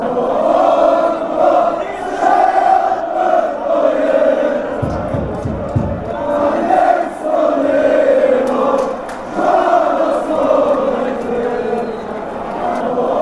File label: slovenia chant